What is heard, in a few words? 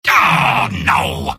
soldier negative vocalization; tf; mvm